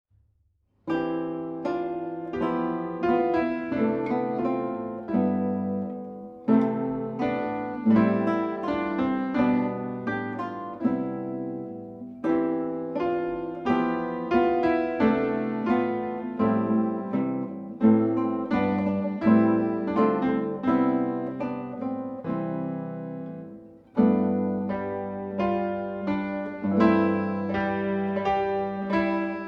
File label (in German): Kleines Schlaflied Duett